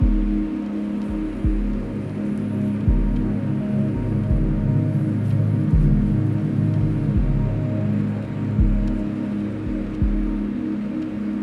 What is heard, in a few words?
loop